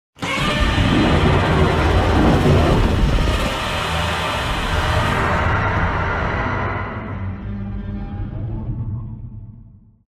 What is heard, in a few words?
Godzilla roar